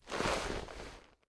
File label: hunter roll; ambient; alyx; hl2 ep2; ambient, levels, outland